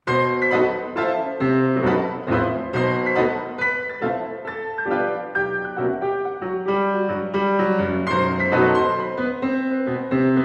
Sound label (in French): Chansons a quatre voix; Schmitt; IV. Boreale; Musique francaise retrouvee